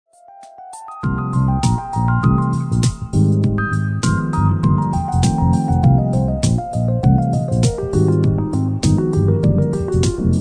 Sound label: smooth jazz cafe